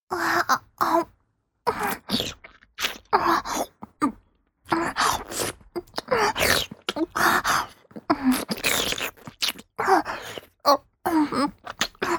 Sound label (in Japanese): パイズリフェラ(中)：陰茎を胸でしごきながら亀頭をしゃぶる; 実用ボイス抜粋集